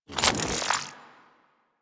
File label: Spear Throw Charge B, Weapons; Warframe; Corpus; Spear Gun